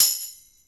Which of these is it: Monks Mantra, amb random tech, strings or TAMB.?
TAMB.